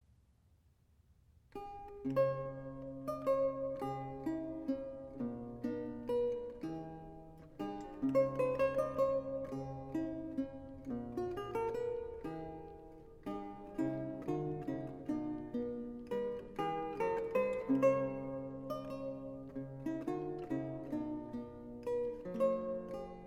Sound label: Lute Solo